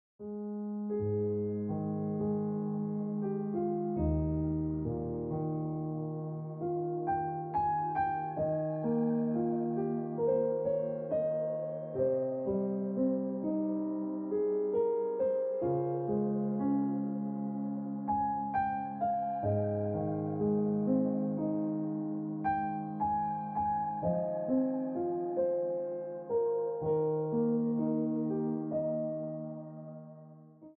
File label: Ringtone Piano Music